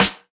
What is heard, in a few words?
2000s studio steel snare drum sound g key; modern snare drum